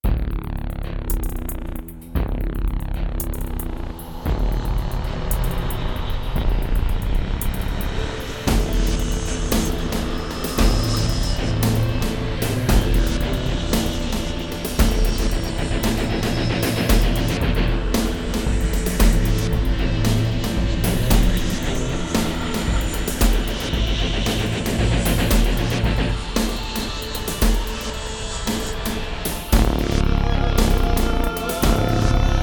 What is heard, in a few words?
goth rock